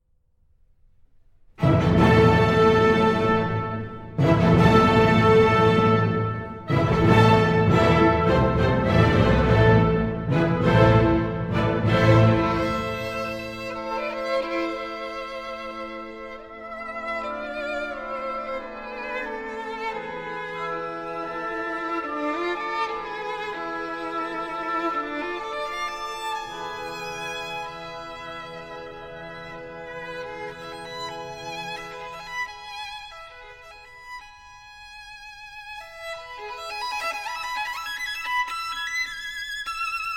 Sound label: Violin Concerto in A Minor, I Allegro ma non troppo